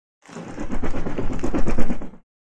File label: Bat, Fruit Wing Flaps. Low Pitched Flaps Of Bat Wings. Medium Perspective.; bats